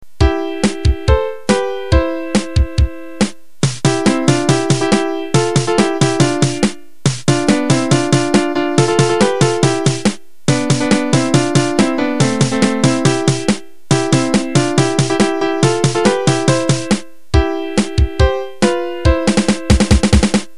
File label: Milk Song melody